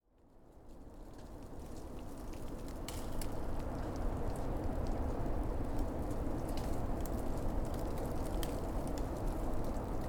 Wind And Trees And Snow

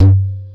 Djembe cheap mic session

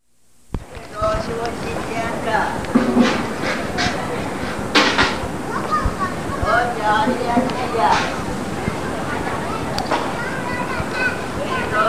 Xian street

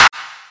Clap

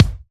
Kick

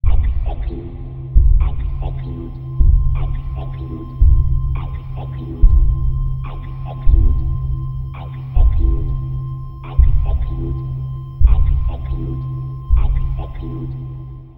Weird Pulsing

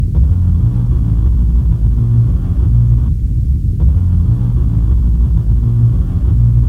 Drone

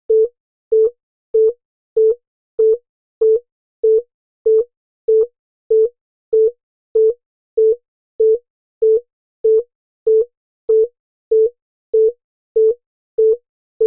Heart Rate Monitor

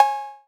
808 Cowbell

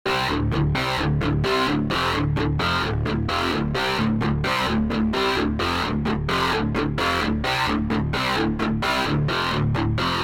Synth Guitar 129.6 Bpm

